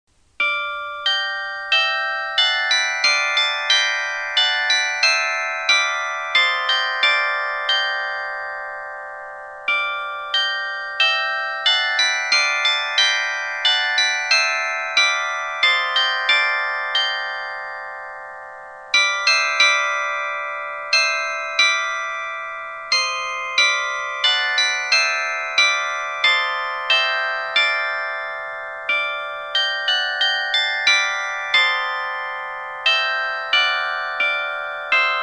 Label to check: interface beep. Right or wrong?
Wrong